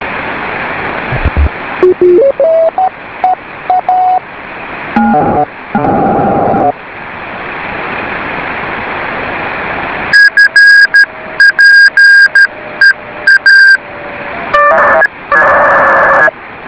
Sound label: beacon